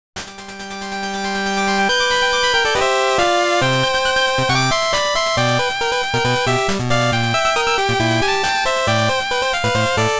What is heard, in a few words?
Ending